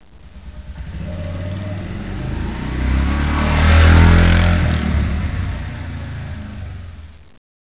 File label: motorcycle